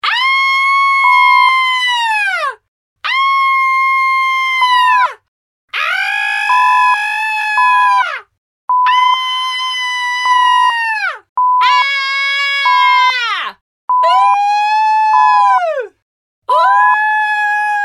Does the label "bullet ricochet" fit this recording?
No